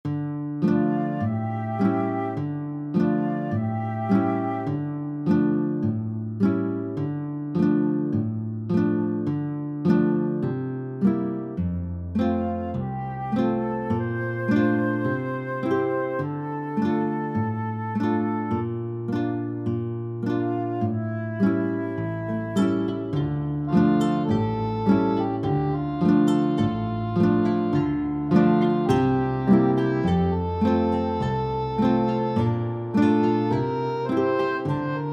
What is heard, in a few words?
dirge